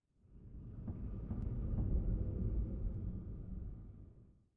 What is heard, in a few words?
Construction Underground; lethal company